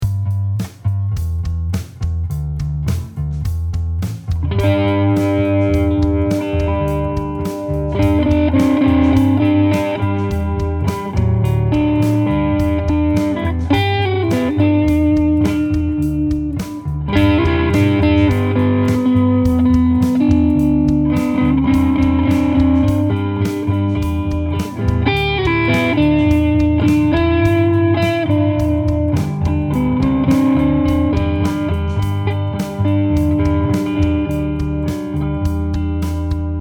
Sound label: walking to the car